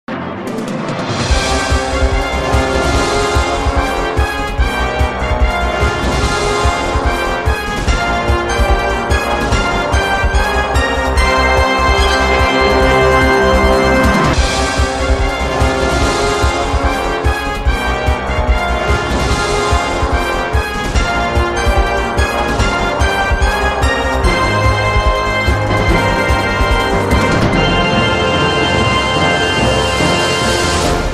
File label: Win (Double String Version); Who Wants To Be A Millionaire